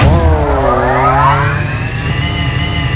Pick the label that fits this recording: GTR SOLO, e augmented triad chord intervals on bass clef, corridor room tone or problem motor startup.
problem motor startup